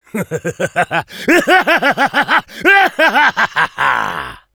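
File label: laugh long, French; Engineer; "(Rire gras)"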